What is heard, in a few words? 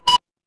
ui beep